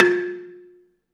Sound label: BELA2 F3 R, BELAFON; USB Soundscan vol.60; AKAI